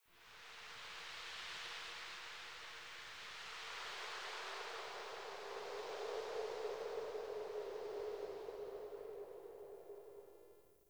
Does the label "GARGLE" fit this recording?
No